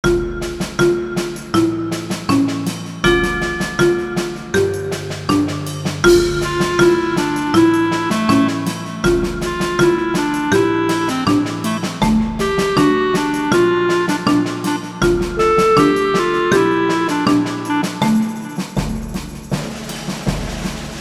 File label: Card Battle Theme; Little Alchemist Remastered